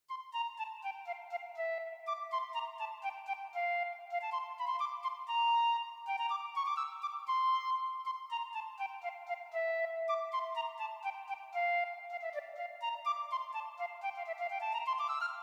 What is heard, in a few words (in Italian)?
flauto